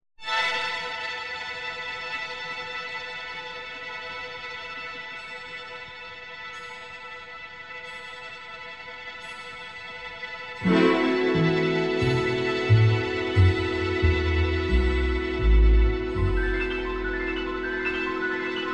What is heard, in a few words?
Strings